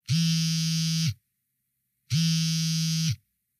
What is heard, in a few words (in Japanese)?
keitai; 携帯スマホのバイブ音4